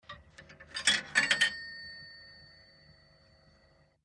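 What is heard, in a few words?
British Call Box 6 Pence I, coins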